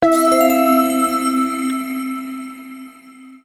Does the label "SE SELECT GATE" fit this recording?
Yes